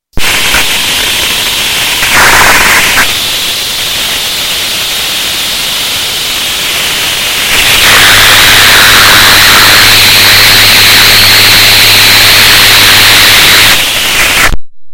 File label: ultrasound from CFL bulb